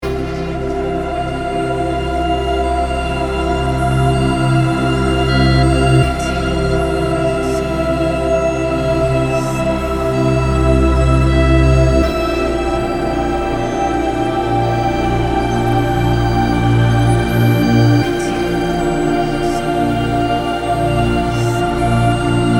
ambient

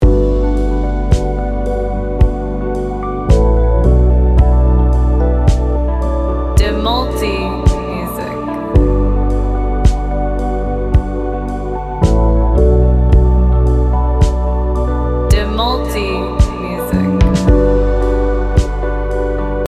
instrumental pop; Lagu ini ceria, penuh cahaya, seperti berjalan di taman saat matahari bersinar hangat. Musiknya menenangkan tapi segar

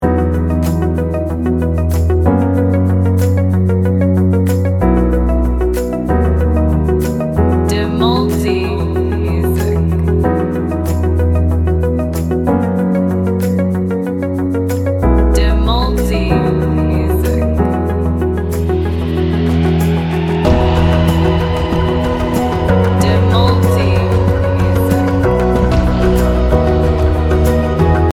instrumental pop; Lagu ini penuh imajinasi, seperti menatap bintang jatuh di langit malam. Ritmenya energik tapi tetap dreamy

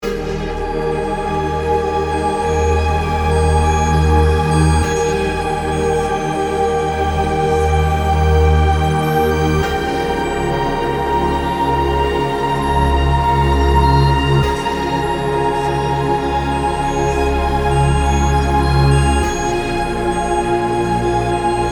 ambient

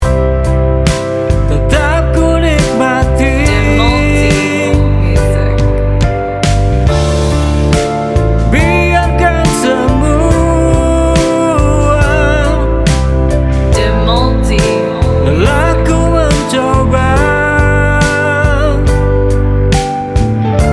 ballad

